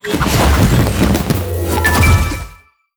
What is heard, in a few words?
sfx mythic upgrade; Animation Throwdown